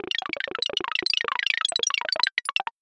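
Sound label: electronic sounds of data transmission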